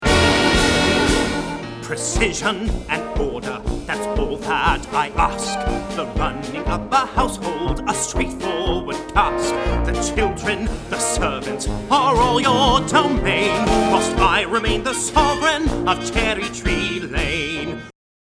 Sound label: Audition Cut Vocals; theater